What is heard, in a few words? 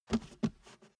step barefoot metal scuff